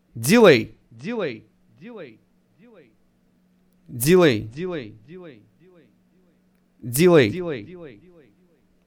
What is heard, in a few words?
Delay